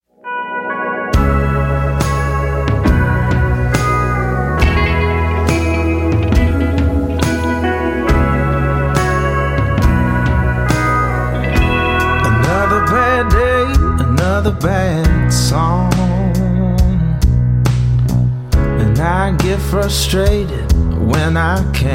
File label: Country music